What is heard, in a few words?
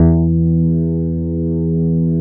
MELO BASS; Synclavier Sampler Library; KEYS AND GUITARS